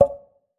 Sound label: Move Small